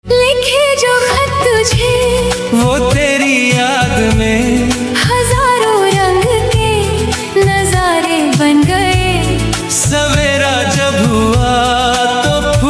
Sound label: DUET version AI ringtone